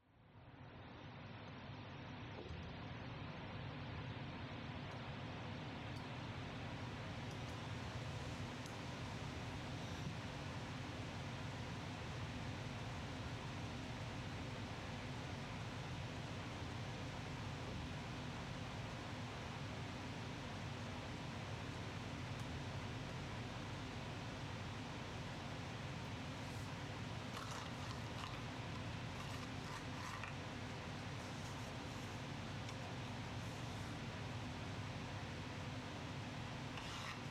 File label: noise